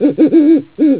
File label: owl